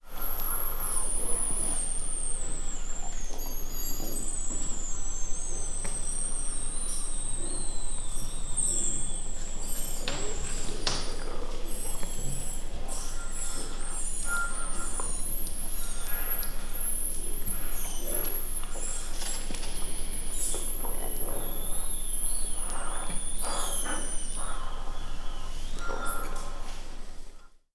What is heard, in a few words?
the bird